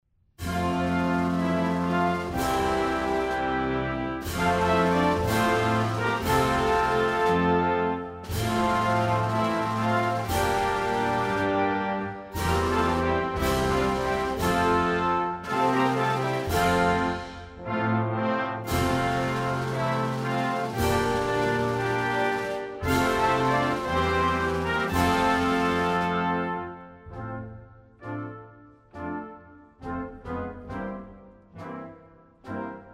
ANTHEM Guinea; africa

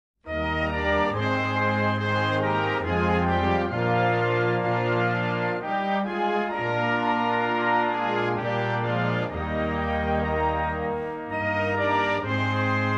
ANTHEM Gambia; africa

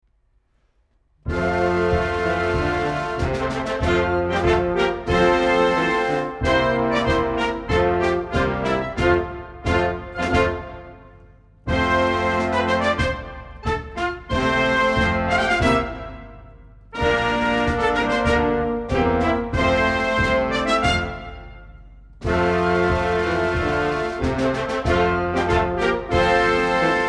ANTHEM Saudi Arabia

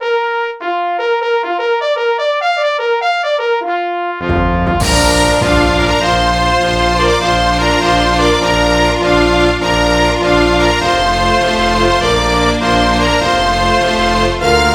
ANTHEM Chad; africa

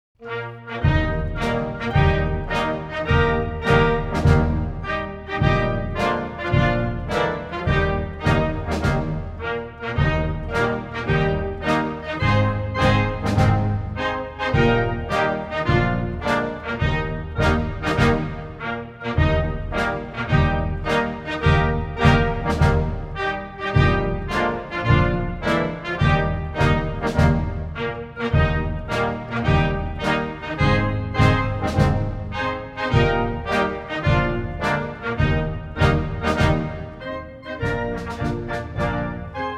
ANTHEM Costa Rica

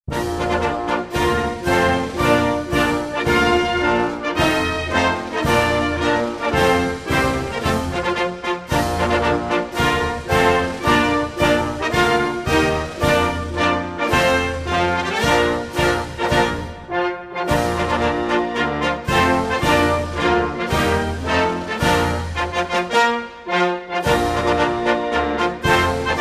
ANTHEM Lebanon

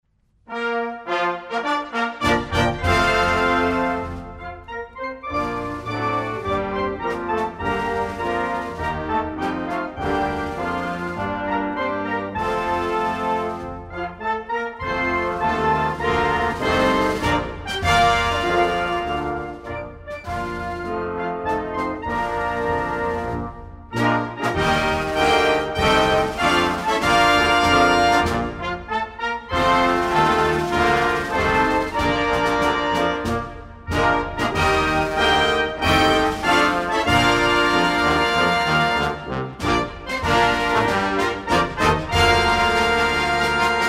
ANTHEM Singapore

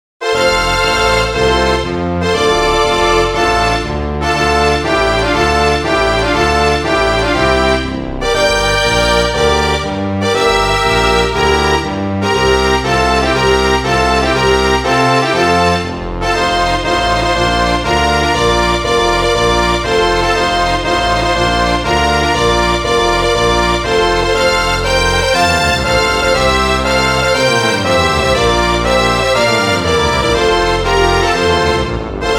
ANTHEM Palestine; asia

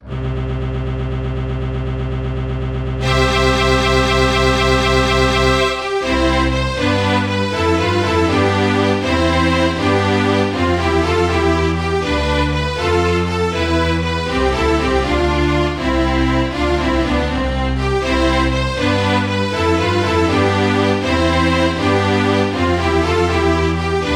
ANTHEM Kuwait; asia

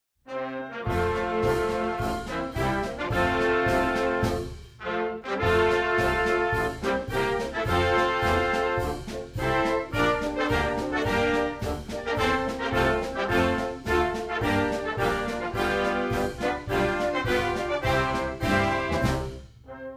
ANTHEM Gabon; africa

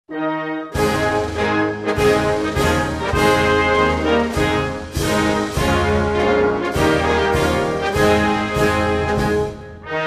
ANTHEM Madagascar; africa

